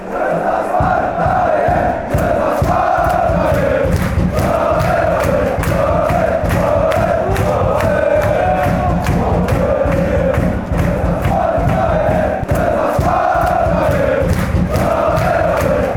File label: fanchants; zelezna sparta